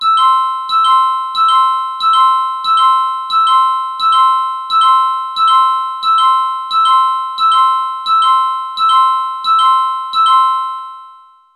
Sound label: nurse call; 緊急呼び出し